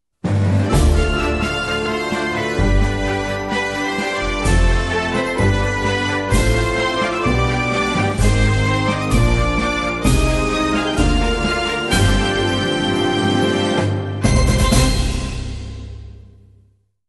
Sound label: Corporate Pride, generic corporate music